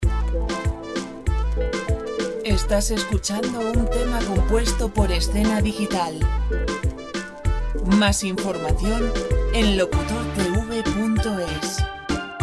97 pop; Música pop sin copyright

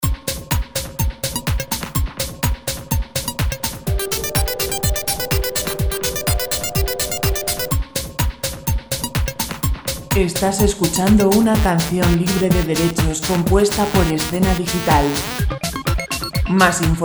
125 dance

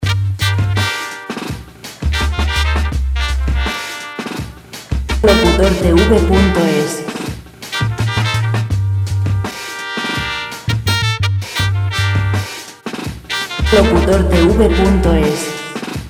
83 jazz; música sin copyright; Background free jazz music for IVR

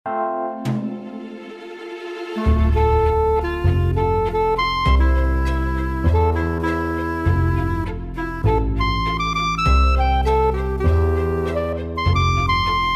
musica clarinete guitar 100 Am lent

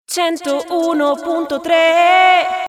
cantante italiana, Italian singer; cantante italiana. Dial 101 punto tres

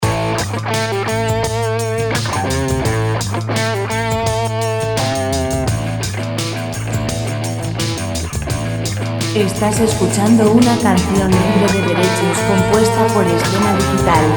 85 rock

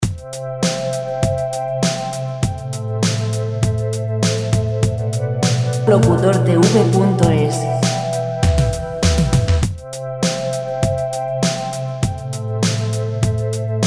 100 dance; dance musica libre

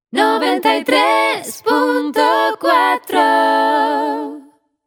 cantante spanish singer

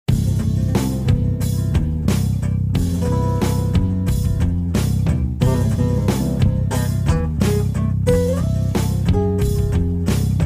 musica clasic guitar 95 C lent

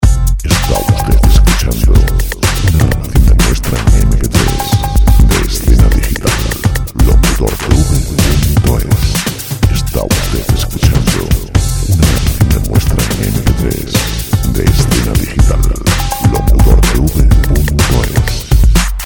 dance; Música sin derechos de autor para dvds. Canciones sin copyright para presentaciones multimedia

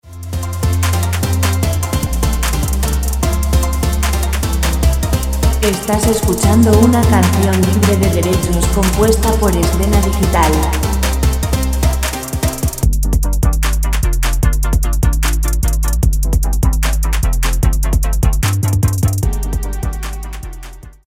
150 dance musica

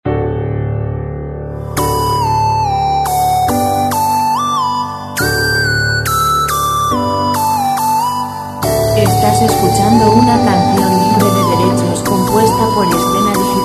piano lent classic musica background music

